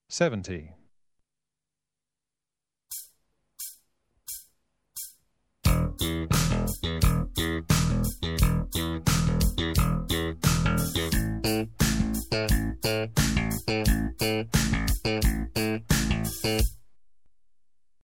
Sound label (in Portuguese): tercinas slap; baixo